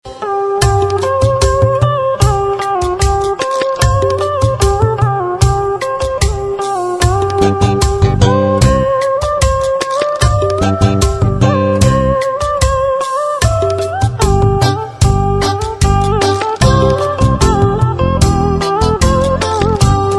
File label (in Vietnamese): trong com doc tau dan bau